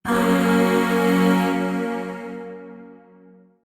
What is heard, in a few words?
Void Shawzin Yo Chord; Warframe; Zariman; Chords